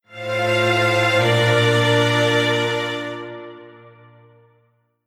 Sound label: Strings; Wasei Kinsoku Renzoku8